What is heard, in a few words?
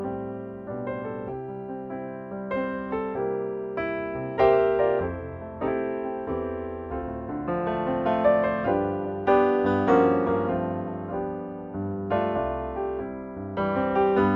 silverbells